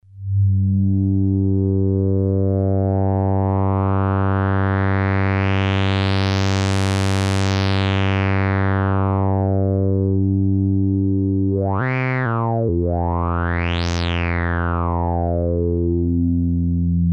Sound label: mf101 synth bass sweep